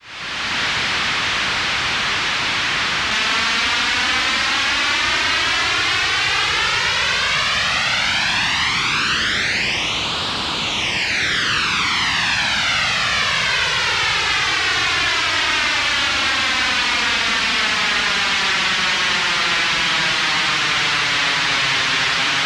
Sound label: noise